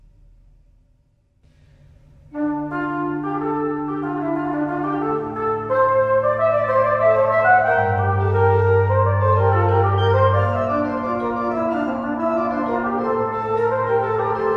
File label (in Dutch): late renaissance instrumentale muziek